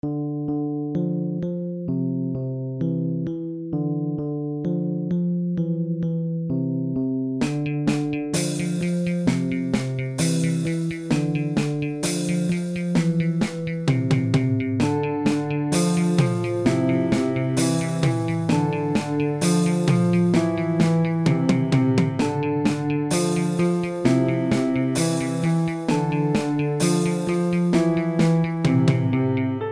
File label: Music for the background; Music that you can use for free in your project; Guitar and drums